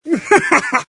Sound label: Mimic; Yet Another Pixel Dungeon; Android